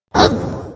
Bomb Collision; Angry Birds Space

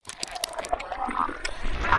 Leech Maw Warm Up

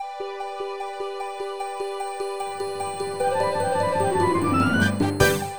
Race Start; Motor Toon Grand Prix

